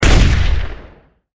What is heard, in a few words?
gun shotgun